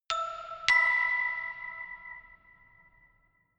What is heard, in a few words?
notification glockenspiel creepy fifth up